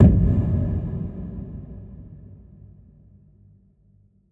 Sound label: Cinematic Boom